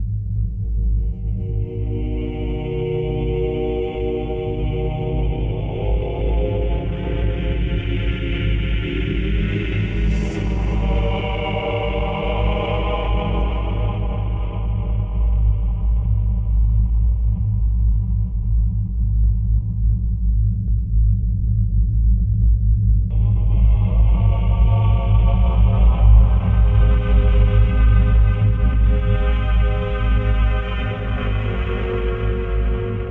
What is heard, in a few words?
monastery (past) - interior; Clive Barker's Undying